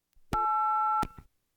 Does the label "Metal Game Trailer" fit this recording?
No